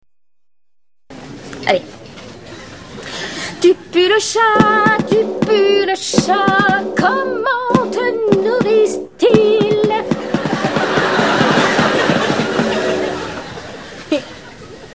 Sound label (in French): tambour